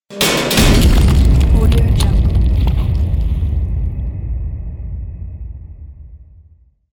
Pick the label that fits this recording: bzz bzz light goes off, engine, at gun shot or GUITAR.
at gun shot